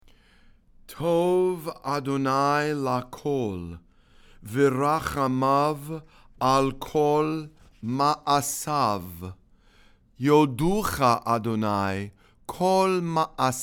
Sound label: ashrei read and sung